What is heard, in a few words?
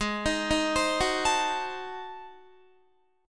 G sharp 7th Flat 9th G sharp down Guitar, chord; G#7b9; arpeggiated